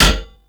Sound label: Cast Iron Hit; Gameplay; Awakening Remaster